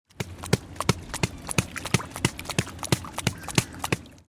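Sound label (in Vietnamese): cá heo gõ đuôi trên mặt nước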